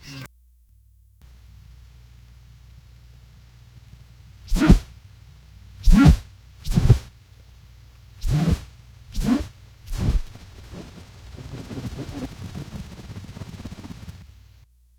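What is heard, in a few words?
giant may beetle wing flapping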